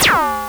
FM B Lam, Drums, MultiMoog

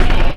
Kick, Kit; Sonic Foundry (Sony Creative Software); Esoterik Beatz; Agrymet

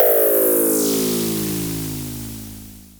FX, Dual Percussion Synthesiser; Klone

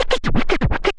SCRAT; Best Service; Advanced Mega Effects

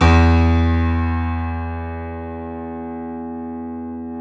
KEY E 1, YC7 Piano mf; Roland - Rhythm Section

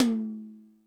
Toms, Drum Machines E-MU Pro-Cussion

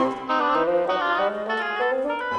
Winds, Orchestral

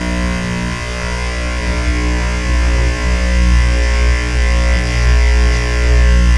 razor res, razor keyz; SFX

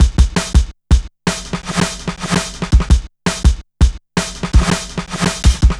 Shuffling Live, Jungle Drum Loops

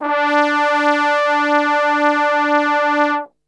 D 2 Brass Super Section; Best Service; TRMBSTRGHT; 90 s Sample CDs

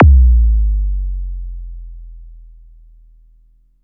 thump; Best Service Alpha Dance vol.2; ZAPS+THUMPS

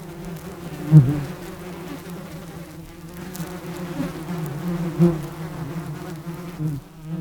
insect, Insects; E-MU Producer Series Vol. 3 – Hollywood Sound Effects; Water

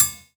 Cymbal, jungle breaks drum sounds

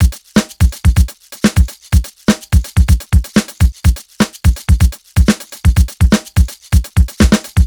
buggy basic, 125 Beats

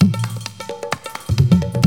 TABLAS; AMG - Now CD-ROM (Roland)

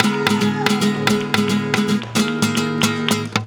GUIT BREAKS; Best Service; World Colours

